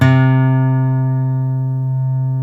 STEEL C, FOLK STEEL Guitars; Spectrasonics; Hans Zimmer Guitars VOL-1

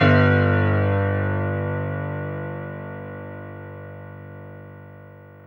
PIA MICRO, MICRO-PIANO, Roland

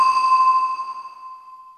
IND; Zero G; Man Machine

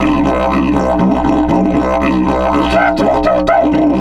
120, Didgeridoo

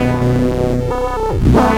Cwejman Lead, EDM; Sonic Foundry (Sony Creative Software); Afterhours EDM; Session 10 - Come In Hard

